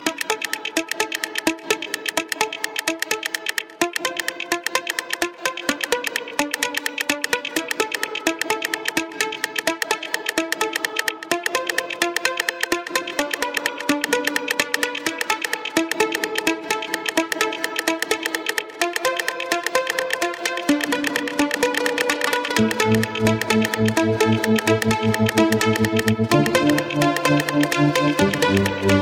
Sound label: Prog House